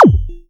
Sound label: Pulse Lumbar Kick; Cwejman S1; DevSnd Cwejman S1 - JK